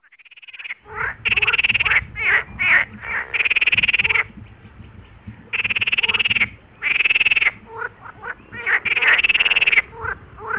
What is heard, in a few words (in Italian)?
rane suono, Apuane